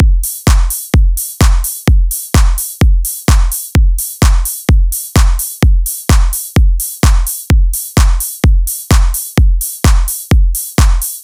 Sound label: Drum Buss Track Dry